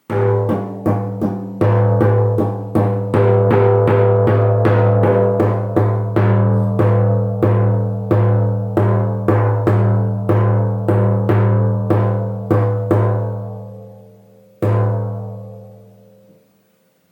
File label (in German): Siberische Trommel, schamanentrommel sibirisches design